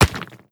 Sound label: stone impact